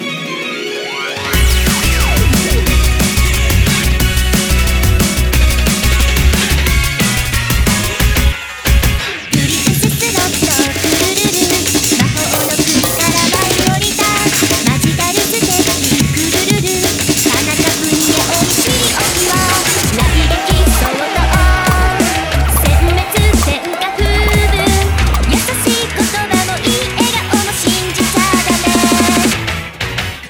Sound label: Drum&Bass Remix; 大魔法峠